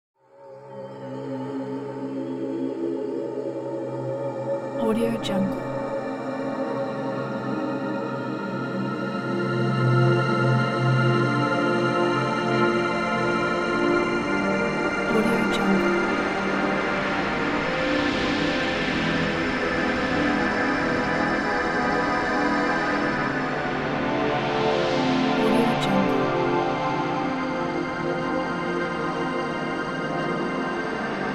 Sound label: devastating atonal synth soundscape